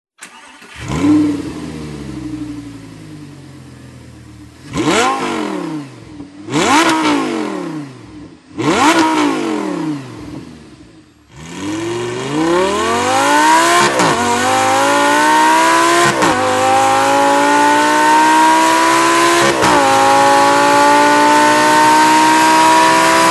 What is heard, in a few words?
Stock Exhaust Lamborghini Gallardo